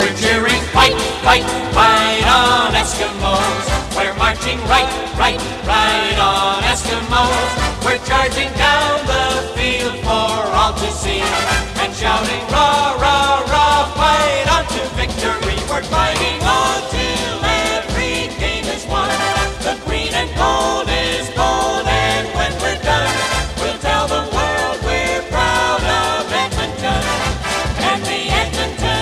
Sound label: Fight Song; Eskimo